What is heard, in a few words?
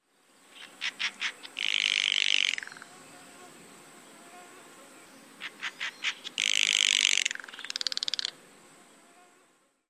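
rattle calls, Steller's Jay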